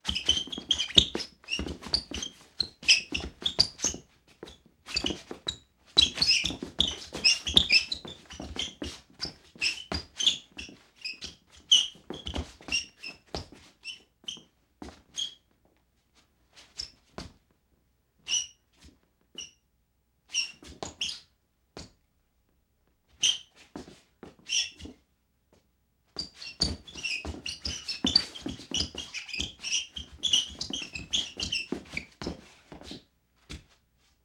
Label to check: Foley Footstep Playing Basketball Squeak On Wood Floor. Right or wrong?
Right